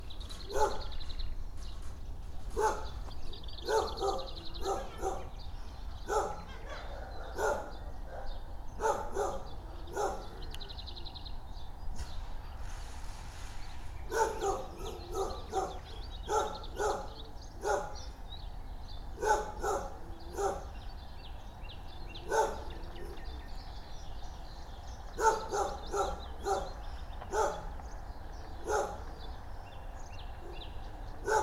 soundwalk, sergiyevposad

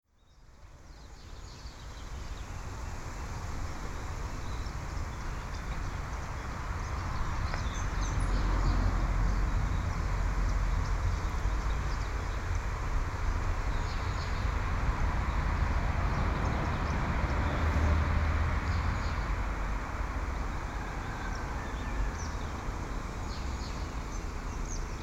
sadovaya street; sergiyevposad

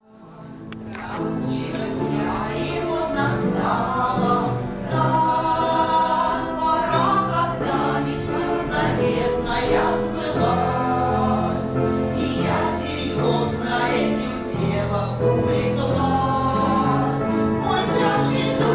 singing teachers; sergiyev posad

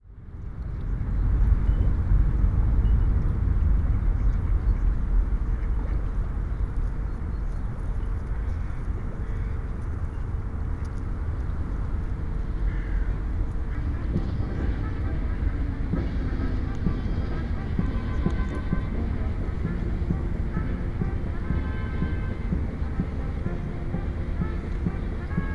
moscow river quay band, moscow

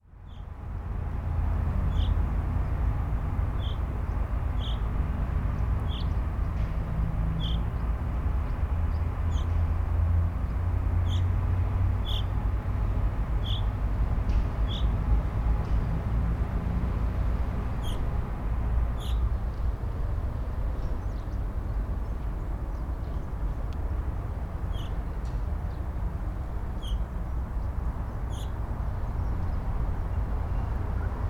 chaffinch, Sergiyev Posad

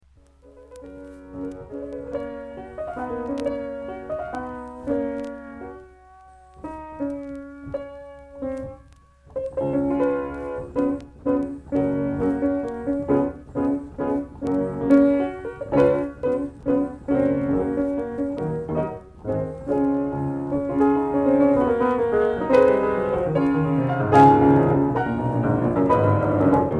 Piano Welte Mignon

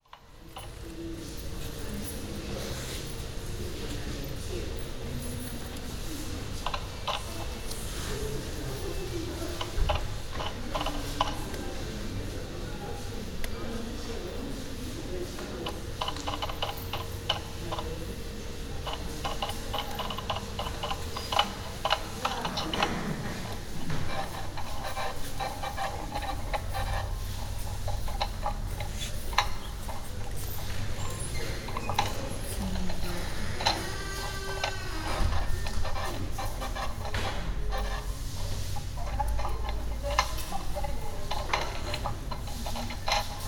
ticket desk, Sergiyev Posad

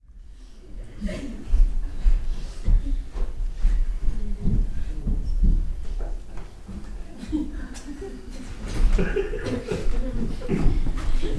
giggle

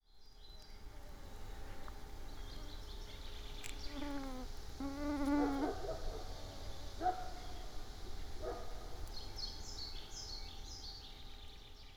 mosquito, Abramtsevo

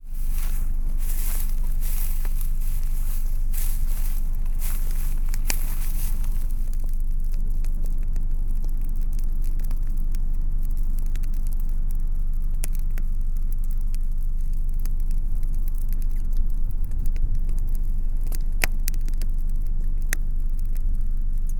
burning grass, Sergiyev Posad

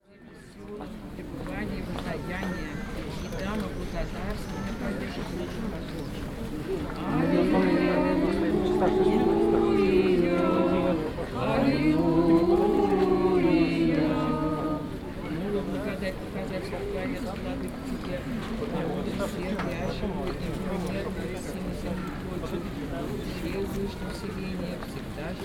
trinity sergius monastery; sergiyevposad

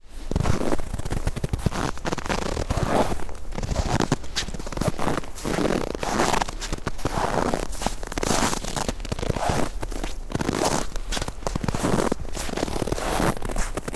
snow sergiyev posad

